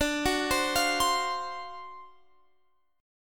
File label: D 7th Sharp 9th D, Guitar Open F; D7#9 arpeggiated; x,21,18,19,20,19